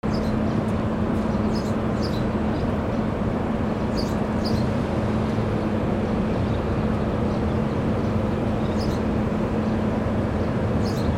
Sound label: BIRDS